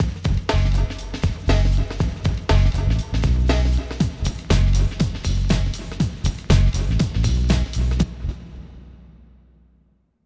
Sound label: Ditty; NBA Courtside 2002